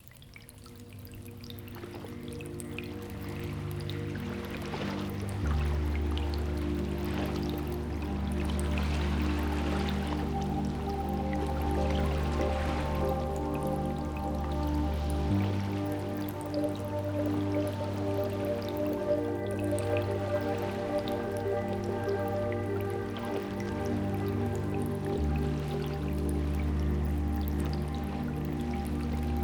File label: Tranquil Trickle Melody